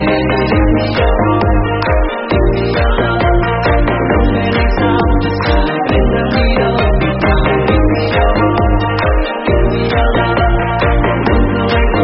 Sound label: remix